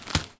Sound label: Foley